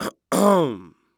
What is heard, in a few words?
Human Male Clear Throat Trying To Get Someones Attention